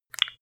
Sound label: landline click